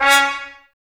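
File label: Brass trumpets C#, Mute; Miroslav Vitous - Woodwind Brass Ensemble; Tps Mute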